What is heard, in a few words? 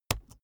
keyboard laptop enter press slow hard; kommunikation; computer